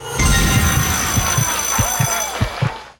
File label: big win; Book Of Pharao